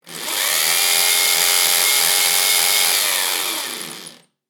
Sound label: power drill; motor; everyday objects